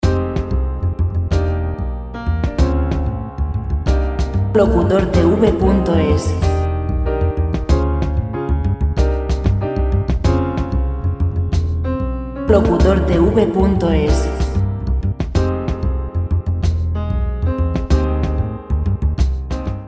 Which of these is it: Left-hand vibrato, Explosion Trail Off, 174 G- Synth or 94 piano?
94 piano